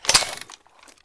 crossbow mag out

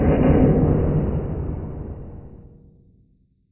freight brakes distant

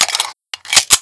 pistol reload no shot

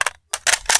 Assault Gun Reload End; ragemap2025; fournines

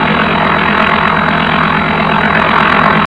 plane

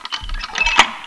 key use

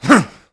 Swing

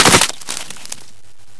ant bodyfall

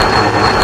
minigun spin; scmod; ut99

